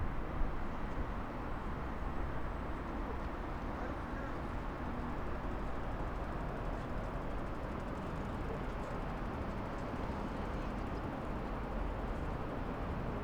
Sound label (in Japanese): 上り電車通過。 続いて下り電車通過。; 録音したファイルを、本体でノーマライズしました。; H5studio 内蔵マイク＋ZOOM ヘアリーウィンドスクリーン WSU-1